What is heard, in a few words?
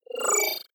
disappear flicker; soundpool